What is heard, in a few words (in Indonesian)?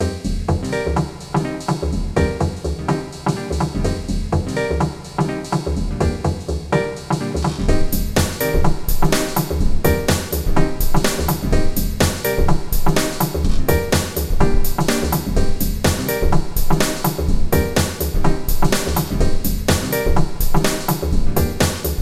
Nada Dering Nokia Jadul; Sashay